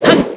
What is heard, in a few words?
slice; hibiki